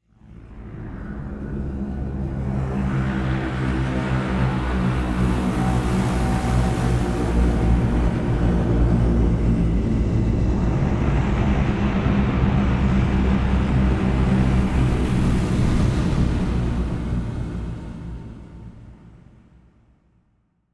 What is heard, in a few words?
london blitz; WW2; WWII; Sherfield